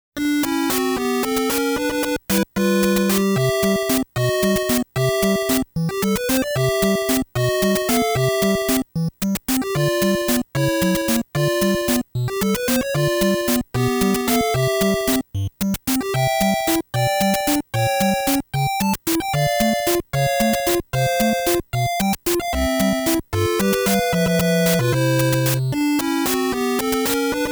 Underwater BGM ~ Warning ~ Underwater BGM (Hurry Up!); Famicom Sound History Series Mario the Music